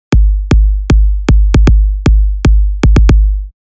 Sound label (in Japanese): 4つ打ちドラムのサンプル（跳ね）; EDM; ハードスタイル等でよくある、ドッ、ドッ、ドッ、ドッドとハネてるパターンです。